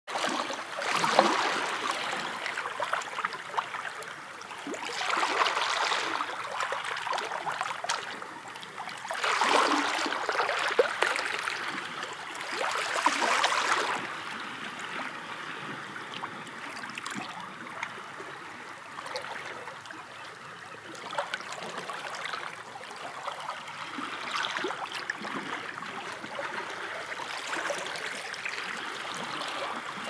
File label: sea small bay; best service Blue Box; sea ocean